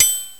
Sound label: Metronome Bell; GMPlay; Drums; XG